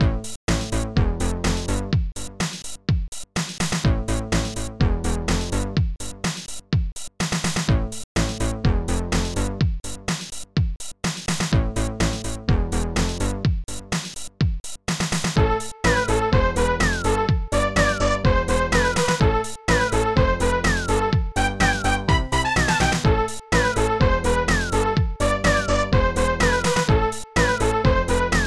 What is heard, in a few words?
Disco